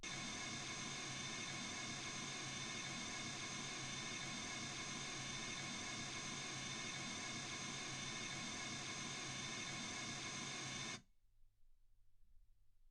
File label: mls 48kHz